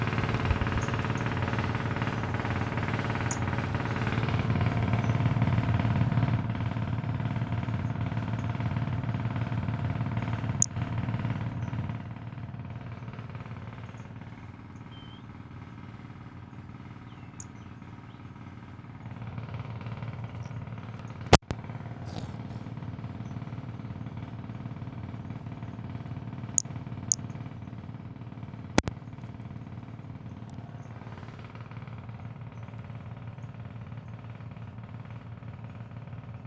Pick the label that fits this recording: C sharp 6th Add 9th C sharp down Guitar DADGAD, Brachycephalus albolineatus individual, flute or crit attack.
Brachycephalus albolineatus individual